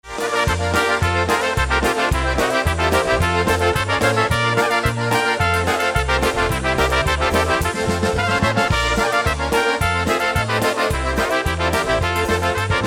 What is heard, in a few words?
Polka Medley